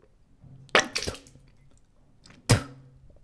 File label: Spit